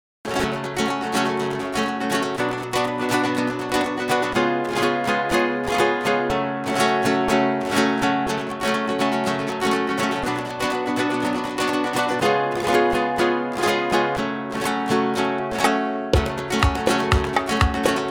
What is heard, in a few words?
Guitar on Fire backingtrack